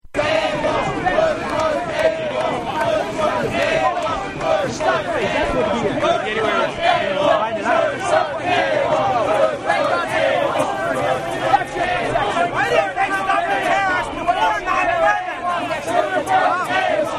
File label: crowd sounds; nyc 29aug04